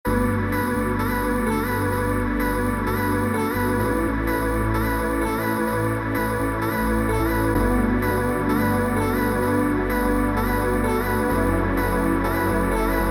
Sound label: backbeat